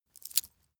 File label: metal pouch impact thin